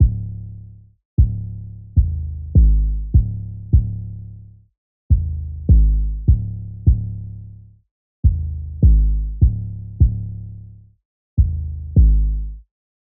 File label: 153 bpm C m 808; HEISTY